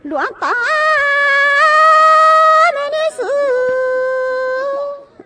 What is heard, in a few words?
miao female vocal sung, love songs